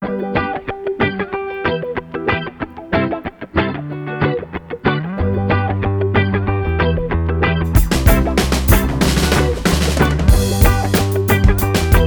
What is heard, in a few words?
Sweet Reggae, instrumentals